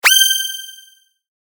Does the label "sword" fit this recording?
Yes